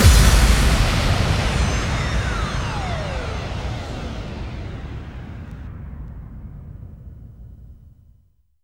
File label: Bassdrums FX, FX Kicks; Vengeance Samples